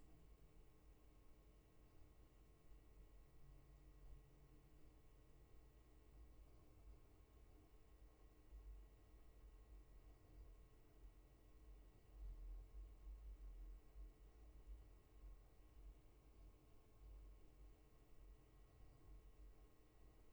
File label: room tone